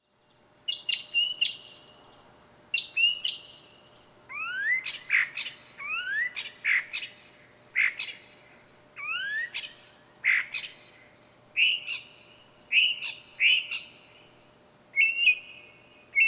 Loud Night Bird Animals Wild

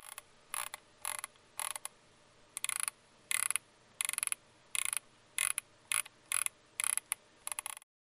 Mouse Wheel Office

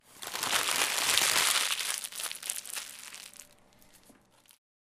Crumble Paper Household

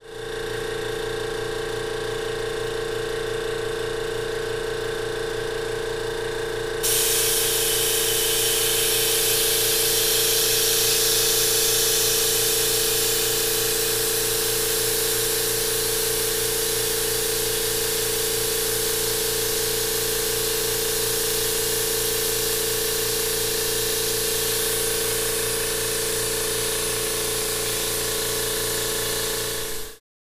Air Compressor Industrial

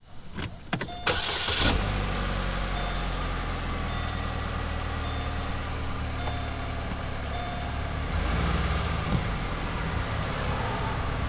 Car Start Vehicles Land

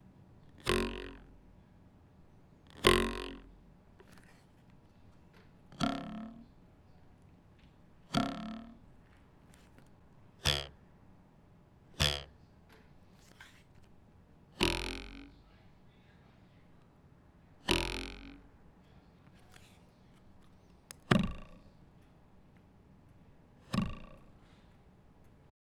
Ruler Flicks; Sound Design

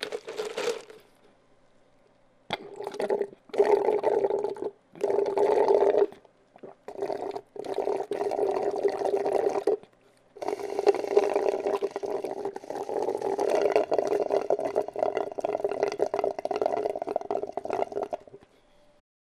Slurp Straw People

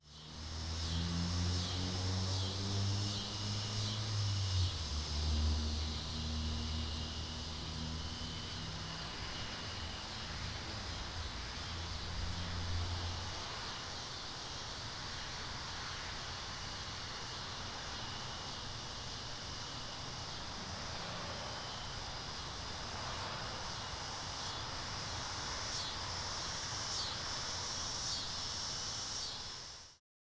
Summer Neighborhood, Outdoor Ambience

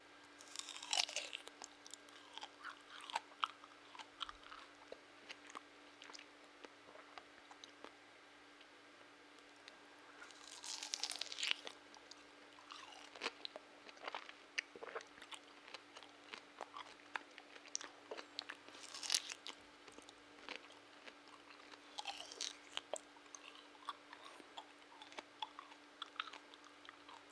Eating Pineapple, Physical

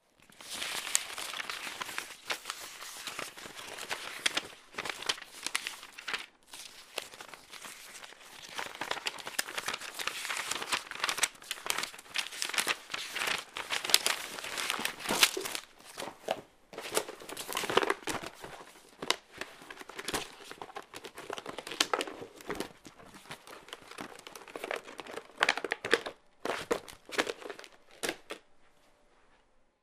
Rummage Office

